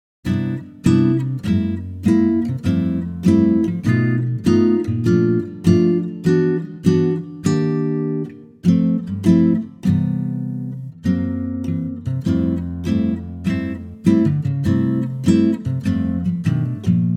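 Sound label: Acoustic Guitar C; key - C; acoustic guitar arrangement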